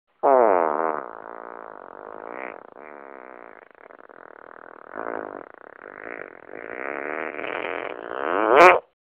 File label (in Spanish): Auténtico, pedo